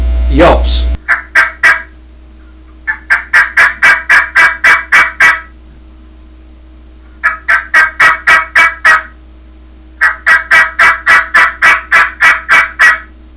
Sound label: dia yelps, turkey; dixie